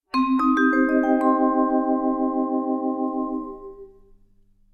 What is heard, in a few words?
vibraphone maj2min